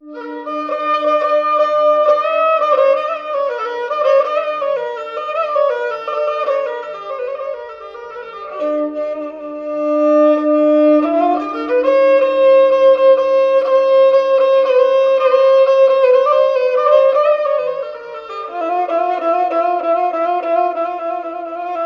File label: japanese violin